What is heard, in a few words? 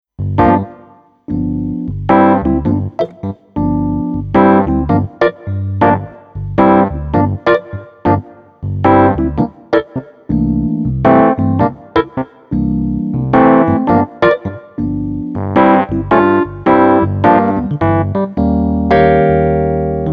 Amp